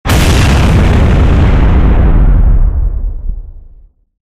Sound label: explosion; gow; fortifications